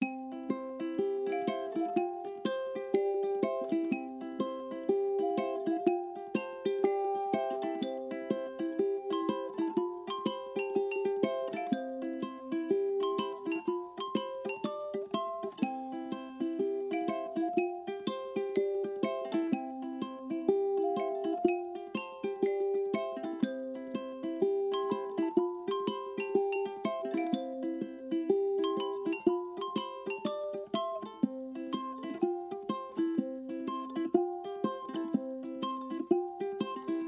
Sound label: fruit Music Bg